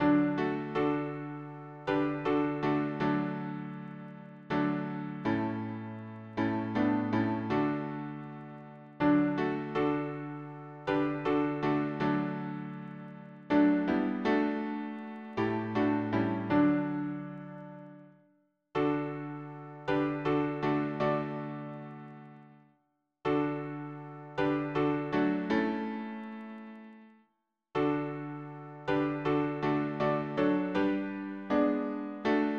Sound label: harmony piano